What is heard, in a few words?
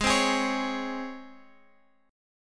G sharp Major Add 11th G sharp; G#add11 strummed; JGuitar